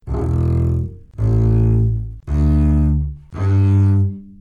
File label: Upright Bass Arco EADG